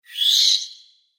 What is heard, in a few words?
Metallic Bat Noise; Sci Fi